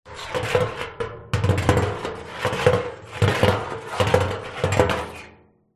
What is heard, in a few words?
metal oil drum object scrape rub on top; foley